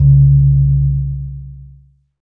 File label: Gamelan Gong; Cymbals